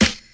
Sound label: short acoustic snare b key, snare single hit tuned to the B note